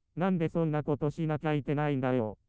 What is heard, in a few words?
synth